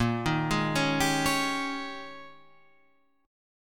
Ab Major 7th Suspended 2nd Suspended 4th Bb, Guitar